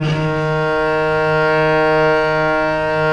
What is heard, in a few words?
D#2, Historical Instruments, VIOLE; USB Soundscan vol.23